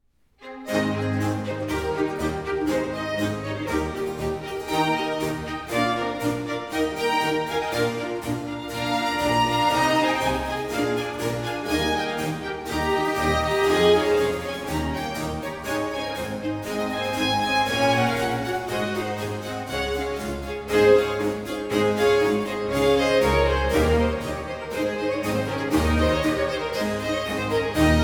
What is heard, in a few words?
Concerto for Strings in G Minor; Il delirio fantastico, Vincent Bernhardt; No. 6